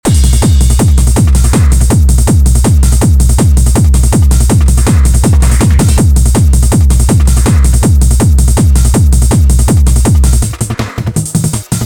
Hard Techno; drum; beat; Drum Blaster: The Ultimate Beat Generator, Sampler Plugin for Modern Producers

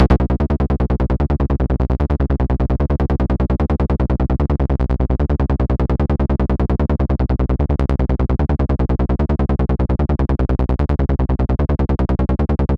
Trouble Double Bass; abx3 trance presets vol2

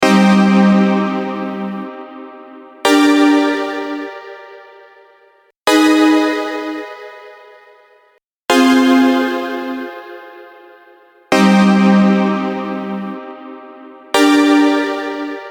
Chorder Staber, analogue stab

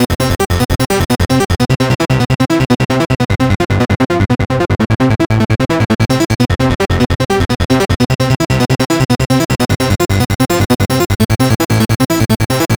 Lovin Trance, acid trance techno; acid trance techno vol1

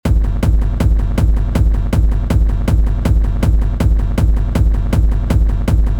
hard techno kick; classic jam; hard techno kick generator

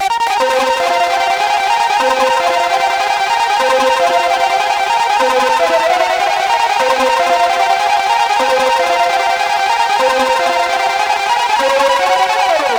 Glitch Tune Lead, trance presets; abx3 trance presets vol2

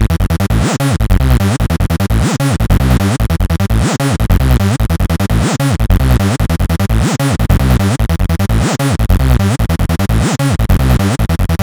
Hoovered, trance; abx3 trance presets vol2

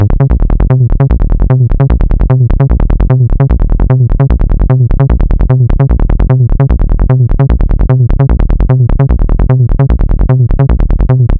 Buster Bass, trance presets; abx3 trance presets vol2

